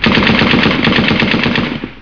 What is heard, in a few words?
Machine Gun